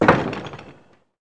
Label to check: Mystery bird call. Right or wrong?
Wrong